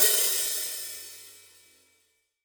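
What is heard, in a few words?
open hi hat, Real Drum Kit; Best Service ProSamples vol.43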